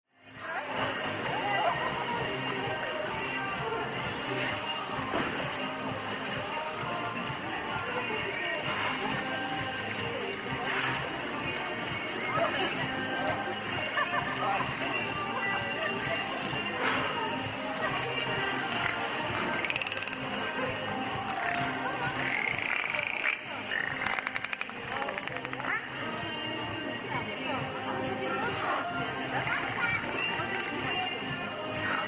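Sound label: dolphinarium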